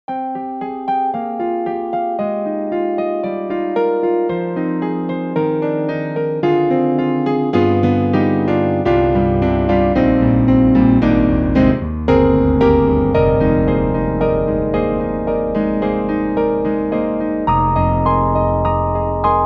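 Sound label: 70's blend piano; EDIROL; Super Quartet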